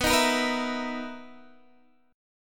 B Major 11th B, guitar

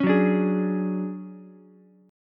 D Major Sharp 11th, D, down, Ukulele C6; strummed

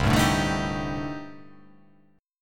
C Minor 6th Add 9th C

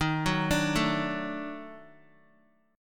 D sharp Minor Major 7th Flat 5th, Guitar; arpeggiated

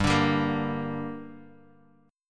G Minor G; strummed; JGuitar

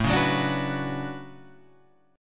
D Major 13th A, chord

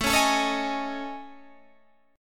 A Major 7th Flat 5th, down Guitar DADGAD

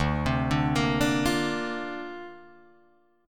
D-Minor 7th Sharp 5th, D, Guitar, Drop D

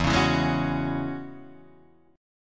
E 6th Add 9th E down Guitar Open D

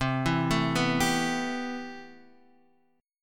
C Major 7th Add 6th C

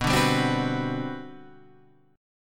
G Major 11th B

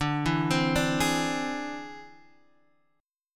E Augmented 7th D

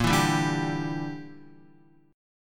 Bb 6th Flat 5th down Guitar; Bb6b5 strummed; Bb6b5 chord

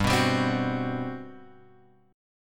G Major 11th; GM11 Chord {3 2 5 5 X 2} strummed

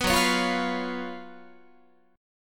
F sharp Major 7th Suspended 4th, Guitar; F#M7sus4 strummed; 14,14,16,15,15,16

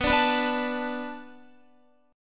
B Minor 7th B Guitar, chord; Bm7 strummed

